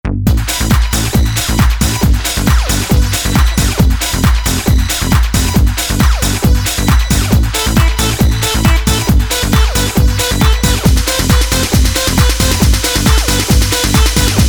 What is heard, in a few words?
killing techno